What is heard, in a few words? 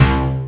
sp12, EMU SP 12; DRUM MACHINES; Kult